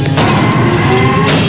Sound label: speedup; cstrike; maya